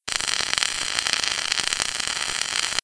Electric Wires; Playable Tutorial Level

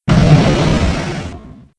Drawers Wood Close; Playable Tutorial Level

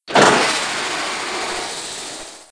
Underwater Torpedo; Playable Tutorial Level

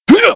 Jump

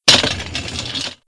Chair; VCI Blueman; Playable Tutorial Level

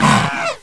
Gorilla Roar; Playable Tutorial Level; TR1

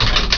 Henchman Flamethrower Click; Playable Tutorial Level; TR2

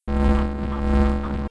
Xray Scan; Playable Tutorial Level

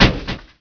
Henchman Flamethrower Death; Playable Tutorial Level; TR2

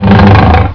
Dragon Leg Lift; Playable Tutorial Level; TR2

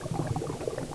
Egg Loop; Atlantean; Playable Tutorial Level; TR1

